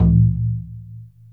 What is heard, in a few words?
MALAY DRUM; Spectrasonics - Heart of Asia CD2